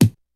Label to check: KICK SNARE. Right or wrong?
Right